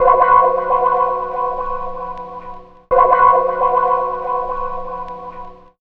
Guitars, 165, B; Total Drum&Bass

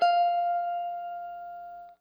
Guitar & Bass; Quantum Leap; 90's Sample CDs

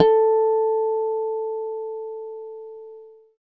E-Guitar, FENDHA; E-GUITARS

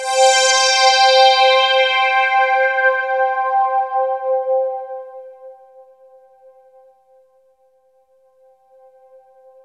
JV-1080

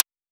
Woodblock; LIMAT Div Dept; Drums

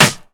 Snare Psycho; Drum Hits; Snares; Nu Dance Electro; Zero-G; Soundclash

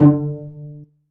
string pizz, Akai S-1000, D2; Samples vol.55 - Retro Sampler

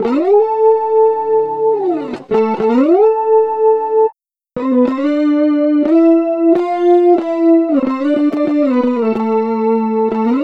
FX Guitar

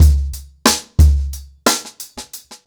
90BPM, ACOUSTIC DRUMS

